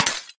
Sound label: tap; mewtwo